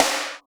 sizzly snare single hit c sharp key; snare drum samples

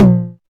mid tom drum single hit f key; tom drum samples; one shots

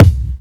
prominent bass drum one shot g key

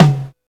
tom one shot c sharp key

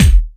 short bass drum single shot b key

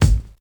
clear kick drum sample G sharp key

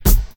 original acoustic snare D key; snare drum samples

